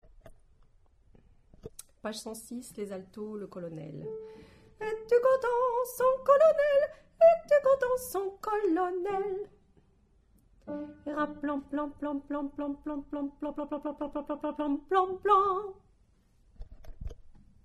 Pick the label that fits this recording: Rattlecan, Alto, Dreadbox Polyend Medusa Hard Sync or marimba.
Alto